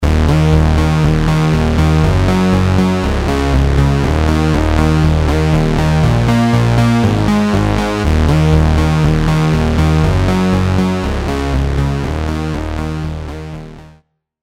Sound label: SX240 80S BASS SOLO